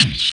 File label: RSS, 160 BPM